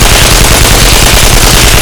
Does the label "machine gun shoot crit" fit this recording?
Yes